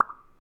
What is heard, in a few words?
Bubble Pop